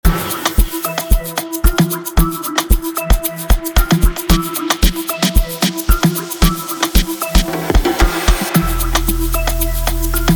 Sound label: Amapiano Flip